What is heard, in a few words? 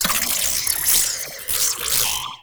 Tiny Creatures; Ambience; Warframe; Corpus Railjack